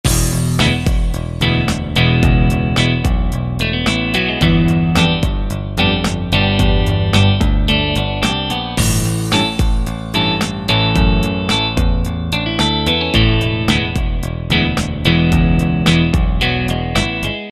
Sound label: Layback